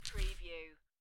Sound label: Dice Grab Collect Two Dice From Felt